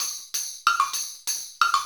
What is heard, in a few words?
Tambourine and Wood Block